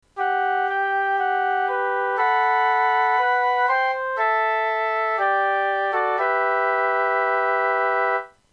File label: Mysterious Cave